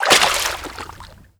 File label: foot water shallow, footsteps